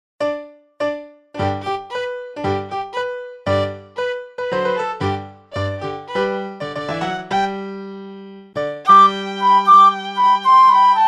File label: piano